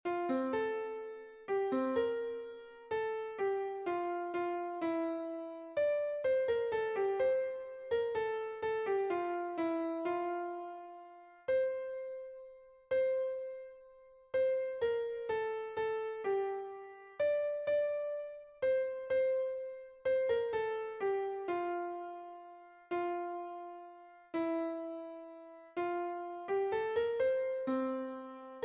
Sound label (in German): Kanon Dona nobis pacem Klavier; Klangschmiede Barock wie Pop